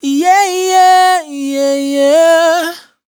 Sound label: riff long yeah E, Dry Vocals; Raw Vocals; Slate Digital Omnivox Vocal Sample Pack